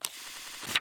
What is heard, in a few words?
start flip